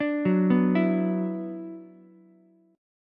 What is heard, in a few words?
F sharp 7th Suspended 4th Sharp 5th F sharp down Ukulele; F#7sus4#5 arpeggiated